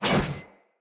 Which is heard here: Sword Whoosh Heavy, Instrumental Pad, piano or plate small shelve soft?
Sword Whoosh Heavy